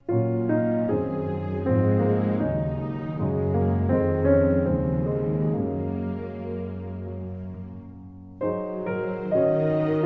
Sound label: With Strings